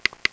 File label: double Click; ProOne: Netcracker Netscape Navigator